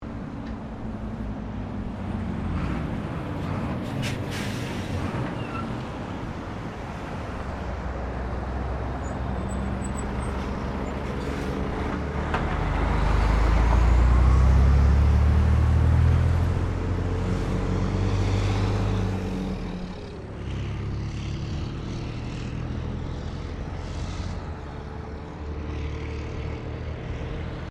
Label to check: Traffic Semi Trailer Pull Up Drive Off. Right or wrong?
Right